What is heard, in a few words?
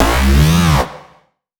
fat growl, bass